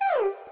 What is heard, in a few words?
Miss